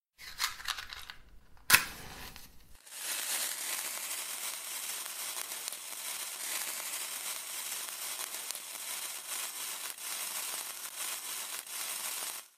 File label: ignite, firework